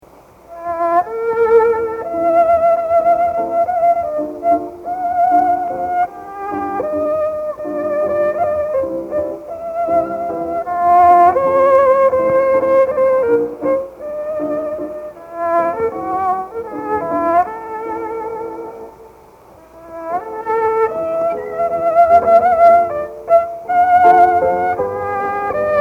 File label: exp instruments